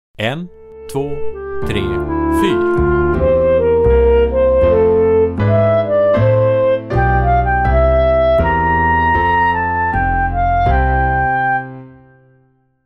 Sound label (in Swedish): Rytm; Övningsprov; Musikex